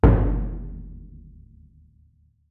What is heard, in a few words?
bass drum; SDIF; ICMC2000